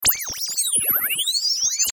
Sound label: Radio Dial Tuner Oscillation High Frequency; Communication Sound Effects; Production Element Imaging Element Accent Transition; Blastwave